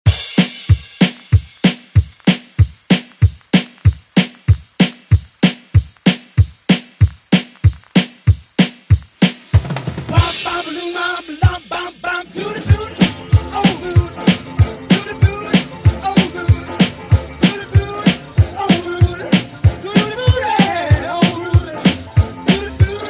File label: Re Drum, 50s